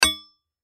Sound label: Impact Metal Spike Nail Wood Railroad; Sound Effects:Materials